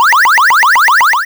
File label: levelup; pokemon